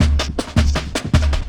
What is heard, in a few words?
SQUISH; Spectrasonic Distorted Reality 2